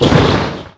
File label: lightbolt